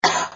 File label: Cough; Lewd SFX